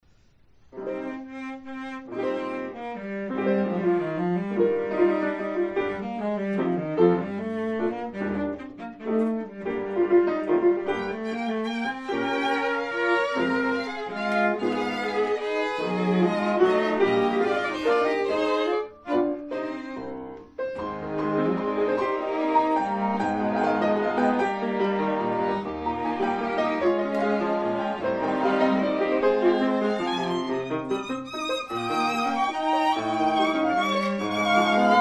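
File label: piano quartet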